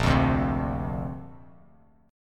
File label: A sharp Major Add 9th, Bass Standard; strummed